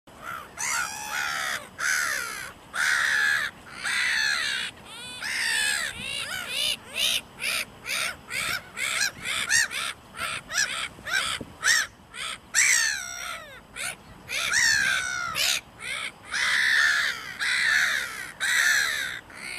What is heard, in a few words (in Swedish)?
skrattmås